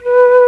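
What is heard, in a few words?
TRON FLUTE, Vintage Instrument; E-MU Formula 4000 Series Vol. 5; Protozoa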